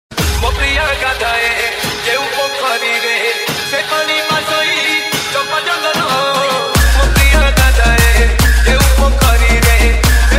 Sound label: Odia Dj Ringtone